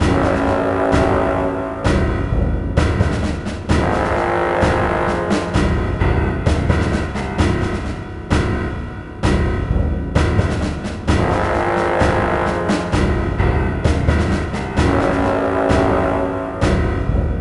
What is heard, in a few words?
Tension; soldier of fortune; Mission 5; Iraq (IRQ2A)